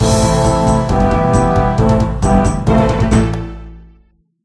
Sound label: Wave Completed!; TzHaar Fight Cave